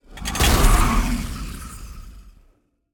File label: fire spell dragon trap; fvtt sonniss gdc audio